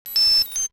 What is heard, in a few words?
Beep Start; SKY IM-S500K; System Sounds